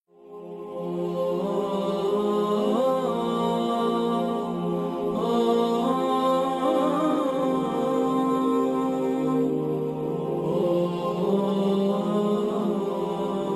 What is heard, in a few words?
Background Nasheed Vocals Only; Background Nasheed BGN 08; اهات اسلامية